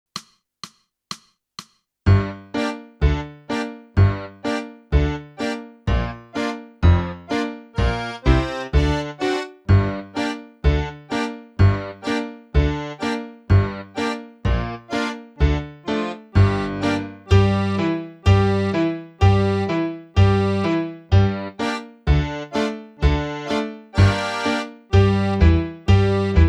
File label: clarinet; boosey method